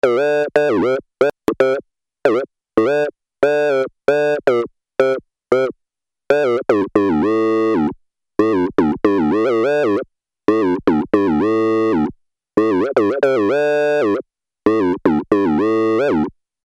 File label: Auto-Melody, Alesis airSynth